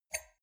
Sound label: wine glass grab table slide medium short; soundpool